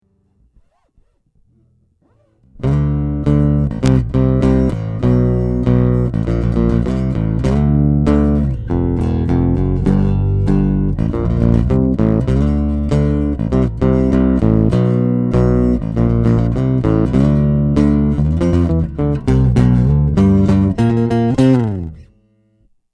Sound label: 8 boosted pick, 8 string